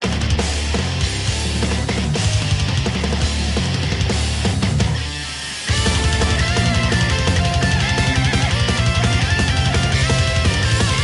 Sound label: metal